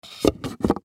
Gift Box Lid Close; Lids; Foley; Blastwave

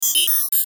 Digital Calculation, Digital, Warble, Flange; Flash, Animation; Production Element, Imaging Element, Accent, Transition; Multimedia; Blastwave

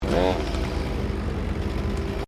Chainsaw startup; Standard chainsaw operating; Machines

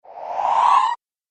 Whoosh Squeaky Sound; sound design

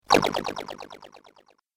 Disappearing Liquid Laser

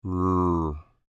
Creature Speak Groan Short, Human; Groaning; Voice; Big Room Sound

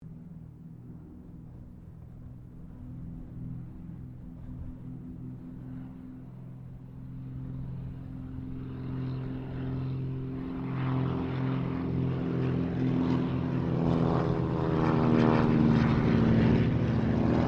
AIRCRAFT CESSNA O-1 BIRDDOG PAIR PASS; Cessna Birddog spotter aircraft; Airplane; Vehicles

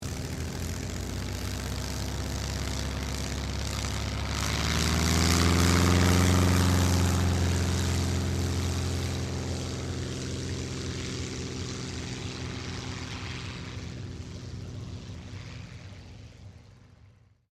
AIRCRAFT CT4A TAKEOFF; CT4 military trainer aircraft; Airplanes; Vehicles

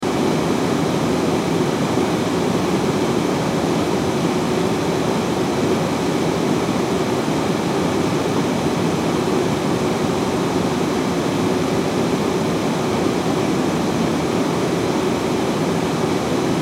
Fan Inflatable Advertisement Generator Loop; Fans; Industry; Blastwave

